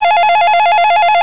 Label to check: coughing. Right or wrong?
Wrong